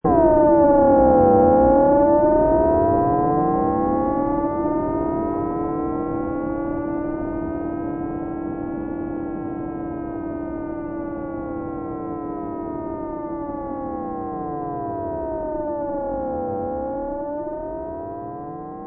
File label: Starship Fueling, Sound of alien craft or flying saucer charging up; Sound of alien craft or flying saucer charging up on some kind of energy, or a spaceship or flying saucer taking off